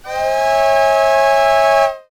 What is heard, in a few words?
steam whistle